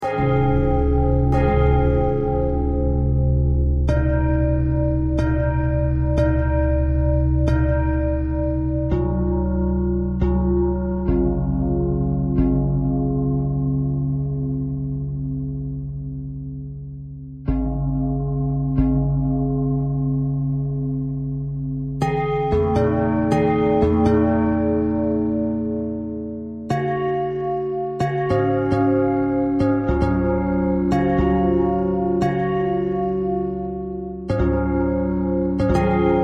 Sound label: bell bell thread pentatonic, bells